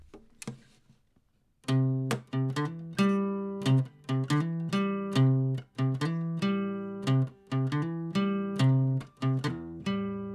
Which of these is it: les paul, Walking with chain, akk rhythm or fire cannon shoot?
akk rhythm